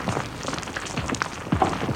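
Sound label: Ruins Rocky Assemble Background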